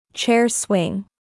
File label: chair swing